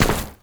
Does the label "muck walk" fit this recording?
Yes